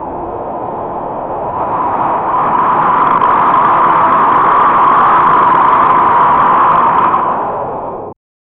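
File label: surprised yelp with dissonant, static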